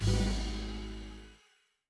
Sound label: Special Photo Place; Mondrian